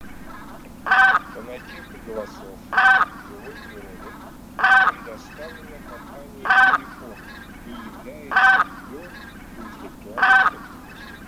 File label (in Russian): Гуменник Oca Granaiola; plurifon